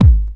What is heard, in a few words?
original kick single shot g sharp key